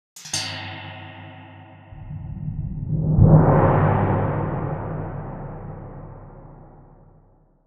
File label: Tam tam